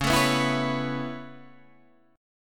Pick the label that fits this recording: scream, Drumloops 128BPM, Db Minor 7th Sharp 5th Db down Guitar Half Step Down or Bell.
Db Minor 7th Sharp 5th Db down Guitar Half Step Down